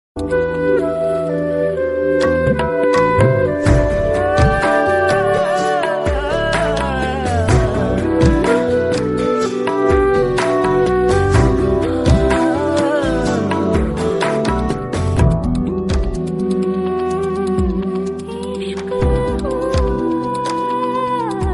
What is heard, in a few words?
instrumental song ringtone